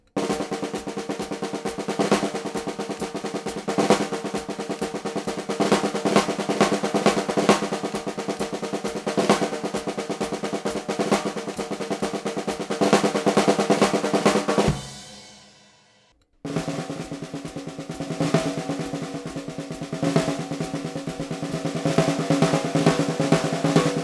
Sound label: snare